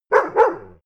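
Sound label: bark twice, dogs; natur-tiere; hunde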